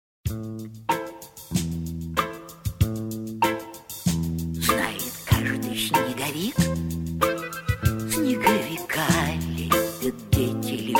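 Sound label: dance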